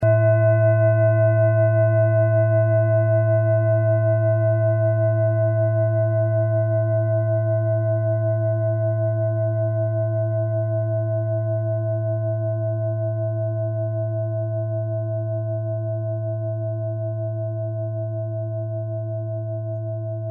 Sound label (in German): klangschale set